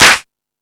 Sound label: Discoball, drum machine; E-MU Pro-Cussion-1